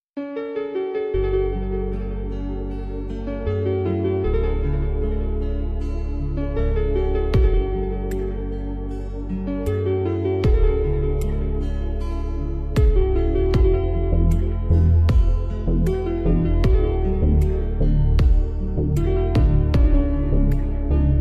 romantic ringtone

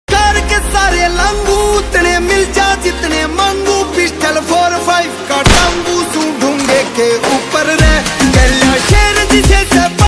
haryanvi song ringtone

